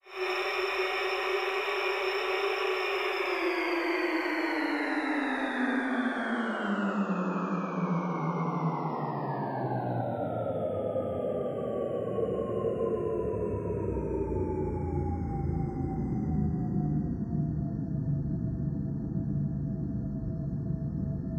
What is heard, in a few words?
High To Low Sweep